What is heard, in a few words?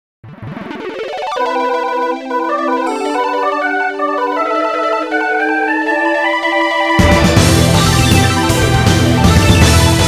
bgm transform; ZeroRanger